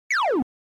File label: Arcade General Pitch